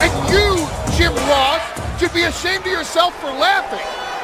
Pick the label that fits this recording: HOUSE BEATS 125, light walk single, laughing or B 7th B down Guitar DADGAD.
laughing